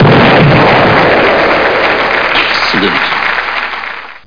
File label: CLAP